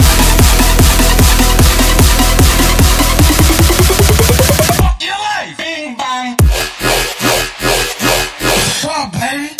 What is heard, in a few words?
edm bing bong